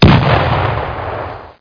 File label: Crunched